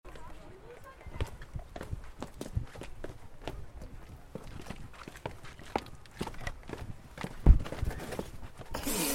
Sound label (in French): AMBIANCE MARCHE SENTIER; college vallee du thore